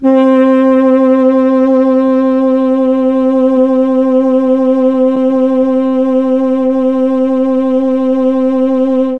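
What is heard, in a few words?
C4 tenor sax, mellotron; CHMB TNR SAX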